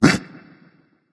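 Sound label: tongue, non-verbal; smite; voicepack; merlin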